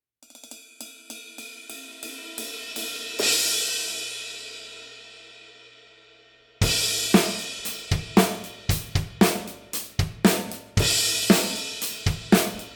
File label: Brilliant Medium Crash